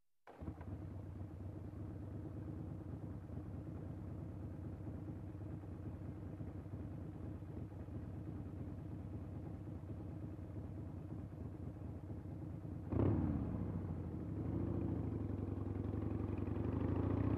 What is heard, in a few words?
Motorcycle approaching, turning off